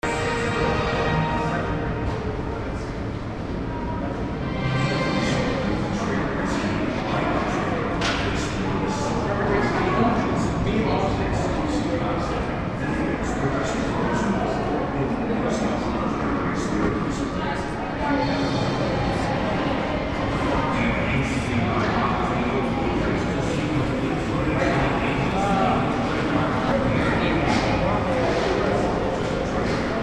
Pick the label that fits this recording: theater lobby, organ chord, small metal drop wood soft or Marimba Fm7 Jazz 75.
theater lobby